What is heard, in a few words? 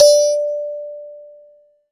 D, Thumb Piano; Best Service ProSamples vol.36 - Chillout